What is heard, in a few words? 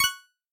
coin